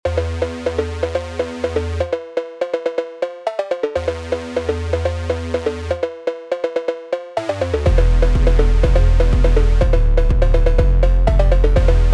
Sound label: C PHOONK